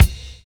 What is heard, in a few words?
LIKE 808